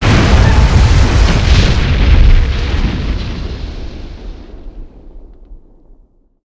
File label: CRASH